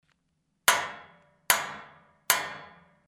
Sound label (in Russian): zvuk udara po metallu